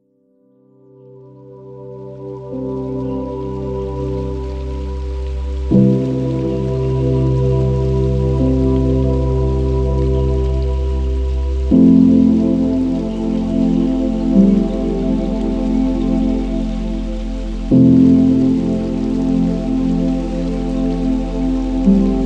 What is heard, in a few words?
Cedar Forest; spa; nature